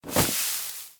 grass hit; Land; Fishing-Travel

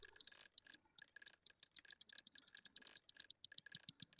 fishing waiting

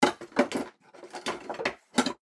wood demolition

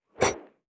glass build; Fishing-Travel

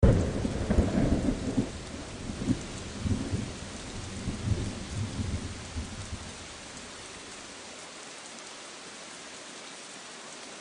BGM Weather Change

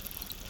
fishing reeling; Fishing-Travel

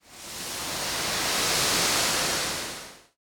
pour sand build; Fishing-Travel

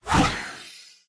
throwing heavy, fishing

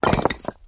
brick; Fishing-Travel

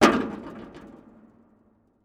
metal impact; Fishing-Travel

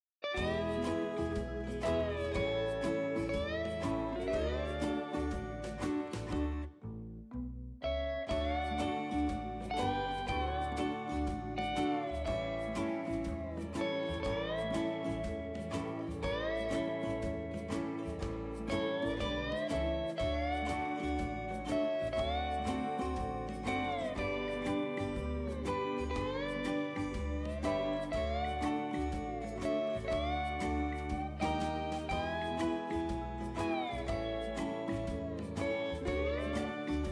BGM Hawaii; Fishing-Travel

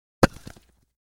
Concrete; Fishing-Travel

